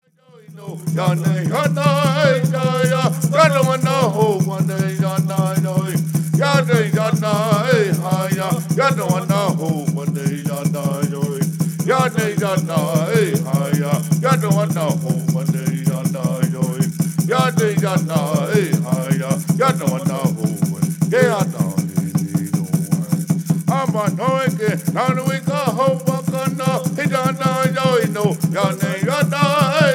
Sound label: Peyote Song